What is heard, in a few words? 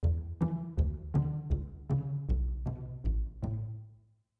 String bass pizz, XV-88